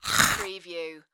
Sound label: Chew Crunch Single